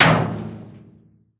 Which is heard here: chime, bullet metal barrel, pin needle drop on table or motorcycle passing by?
bullet metal barrel